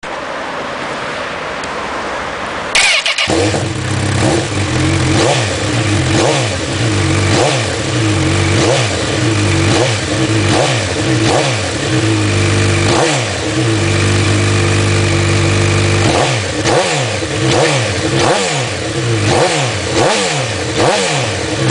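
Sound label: Honda CB1000R, Auspuffanlagen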